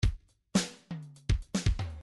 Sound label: midi grooves funk